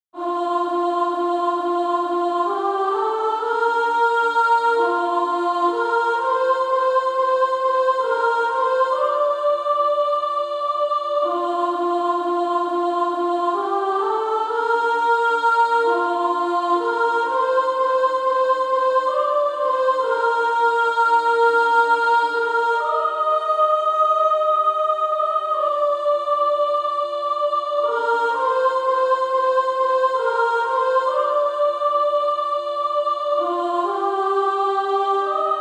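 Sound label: Female Choir, soprano; Redeemed How I Love To Proclaim It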